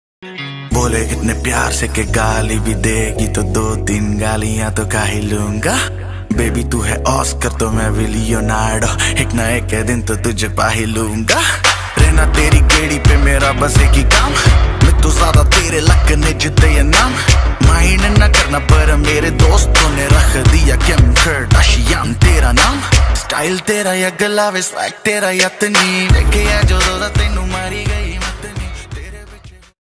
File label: punjabi rap ringtone